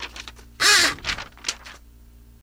Paper Out Typewrite; typewriters

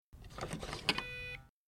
Swipe Key Card Alarm; hi-tech-various

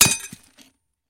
Impact Metal Chink

